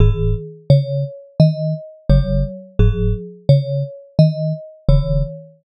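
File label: bgm music box; ゆめ2っき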